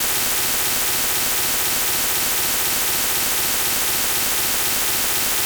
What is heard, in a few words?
18hz noise; tinnitus